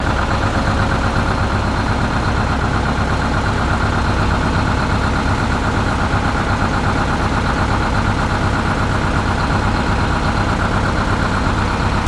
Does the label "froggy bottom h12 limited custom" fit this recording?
No